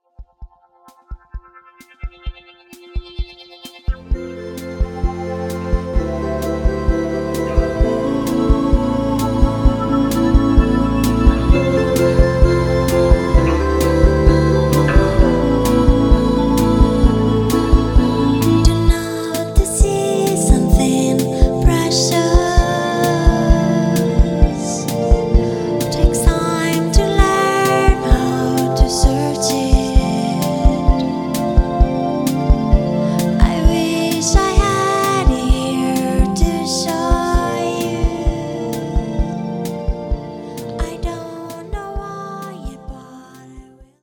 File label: oceanic landscape